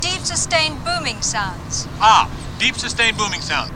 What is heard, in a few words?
deep sustained booming sounds